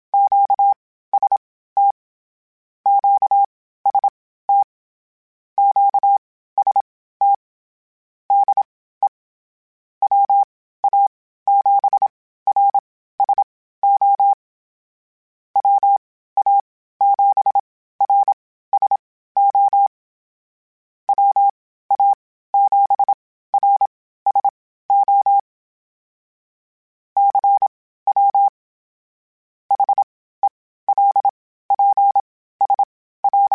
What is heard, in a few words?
QST QST, Morse Code; HamRadio